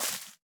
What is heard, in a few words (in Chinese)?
杜鹃树叶破坏声